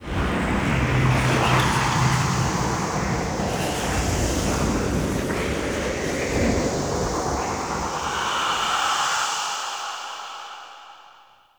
Lifepod Intro; Wraith Quest End

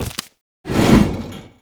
Jump Slam Foley; Warframe; Veilbreaker; Sprag

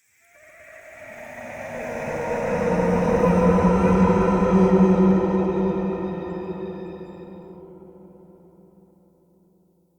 Spirit Lament, Ambience Crypt; Champion Crypt

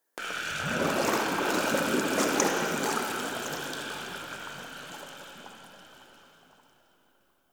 Covered Vine Area Wet Gurgle; Ambience; Misc Outdoor; Warframe; Infested Eris

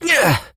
Adult Operator Melee Swing Exert; New War

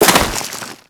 Crewman Step Dirt, Footsteps; Warframe; Corpus

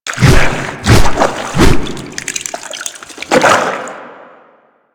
Infested Ancient Small Arm Stun Flesh; Warframe

